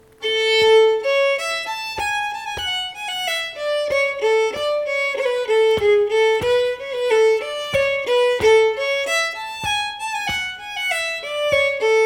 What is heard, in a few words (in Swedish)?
Polska fråk Kvällträsk långsam